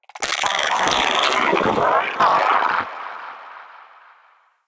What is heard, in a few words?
Shrimp Lantern Vocal Angry, Creatures; Baliq